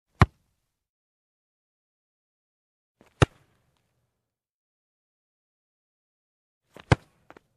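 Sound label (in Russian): удара по футбольному мячу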